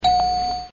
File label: Jeopardy ringin